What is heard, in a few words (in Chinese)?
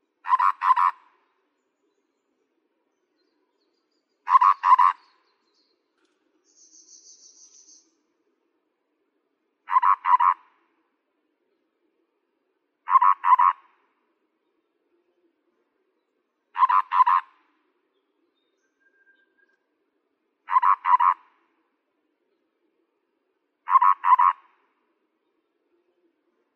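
小彎嘴, Yushan National Park; 小彎嘴畫眉、棕頸鉤嘴眉; 畫眉科/留鳥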